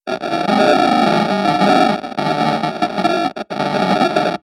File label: video glitch